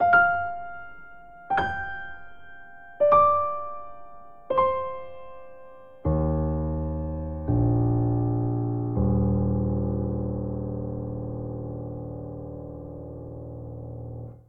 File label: Phrase Relaxing Eb Major9 80 bpm, Grand Piano; MIDI Clips; Ableton; Factory Packs